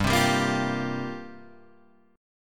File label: C 7th Suspended 4th G